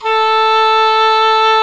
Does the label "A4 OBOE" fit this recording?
Yes